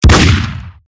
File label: Desert Eagle